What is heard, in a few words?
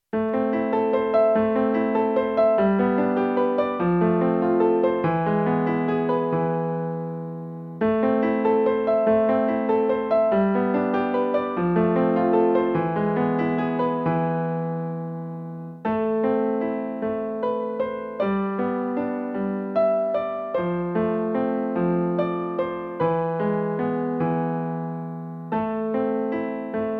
practice spanish guitar